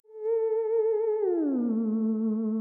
Scifi, Retro, Theremin, Spaceship, Ufo, Melodic Phrases, Octave Slides Falling Then; Viewfinder; Octave Slides Falling Then Rising